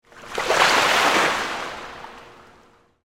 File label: Pool Indoor Splash, Water; Pools; Backgrounds; BlastWave